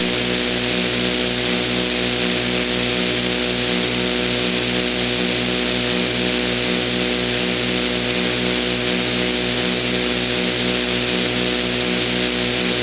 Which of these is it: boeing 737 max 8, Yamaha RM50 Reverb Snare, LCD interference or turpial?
LCD interference